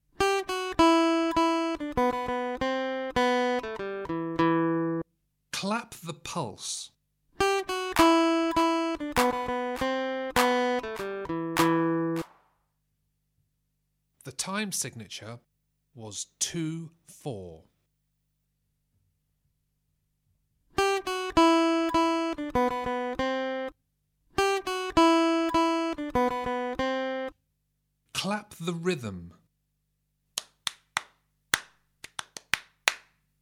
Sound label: Aural Rhythm Test, acoustic guitar; Grade 5